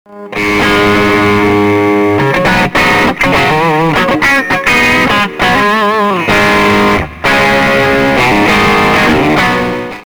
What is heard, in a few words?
digitech badmonkey, effector